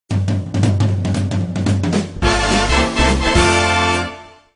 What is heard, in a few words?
Big band; Nokia; 7270; 7270 (ic)